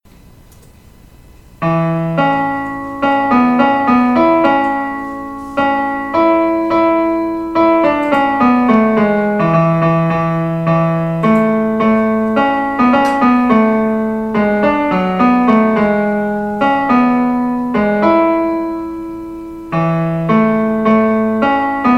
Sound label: piano tenor